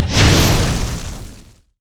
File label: flame breath dragon; LoL; shyvana